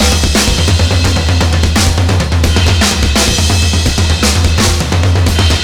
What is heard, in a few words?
drums